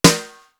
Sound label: BRUSH HIT; East West - Global Sounds; D4 BD SD TOM